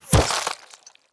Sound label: flesh bullet impact; Rust